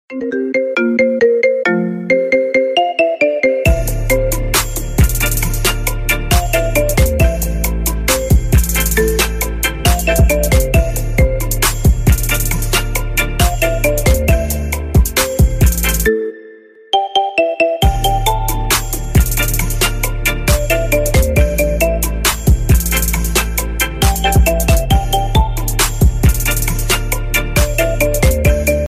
iphone ringtone

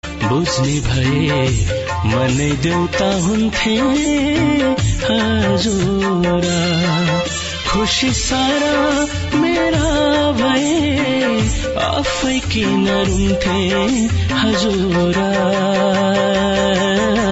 sad nepali ringtone

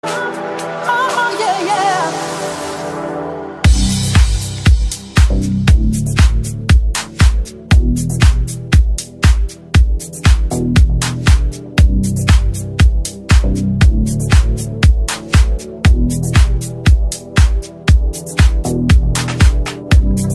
ringtone iphone 8 ringtone

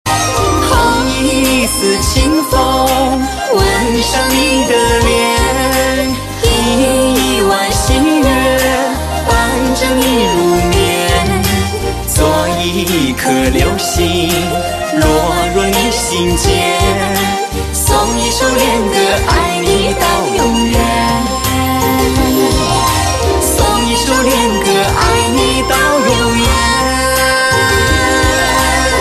chinese ringtone

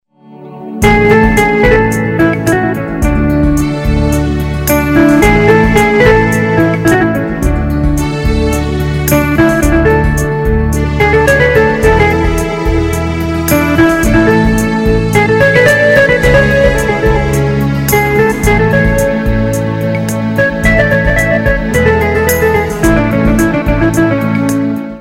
classical ringtone

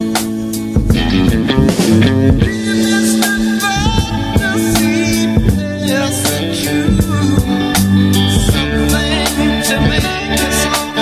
hollywood english ringtone

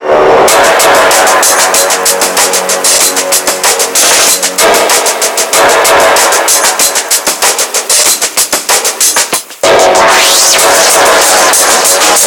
corrosion ringtone

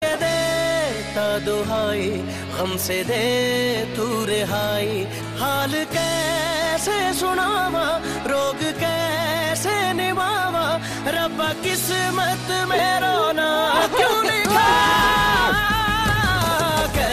ringtone

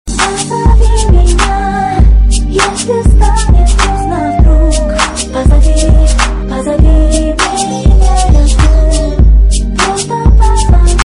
ringtone

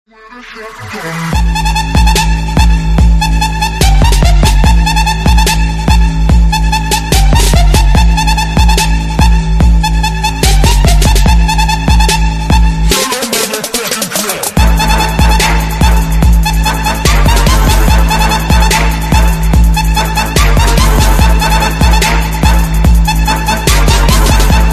shotgun ringtone

